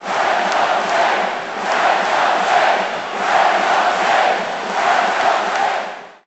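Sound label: Basketball Crowd Chant; Crowds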